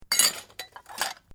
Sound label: China cup fragments collected; china cup breaking; Cleaning Sound Effects; Household